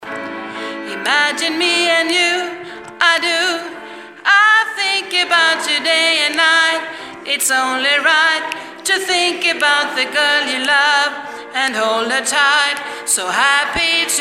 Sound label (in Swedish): sopran